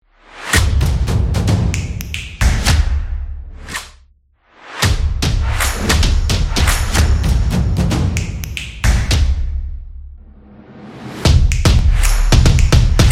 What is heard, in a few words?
Drum Music